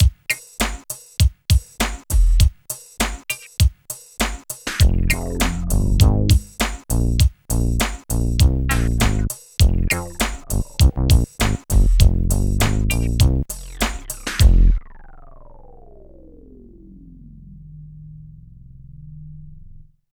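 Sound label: LOOP, left; East West - Phat & Phunky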